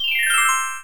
tinkle down; peter pan pinball

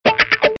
pop

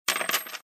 coins; toy story; hamm

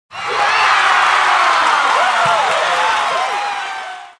cheer

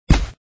land; avengers; city Under Attack

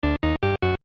song loop; Wreck-It Ralph

